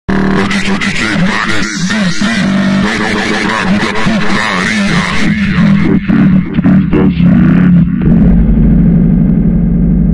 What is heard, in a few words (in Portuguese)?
montagem vozes profundas slowed reverb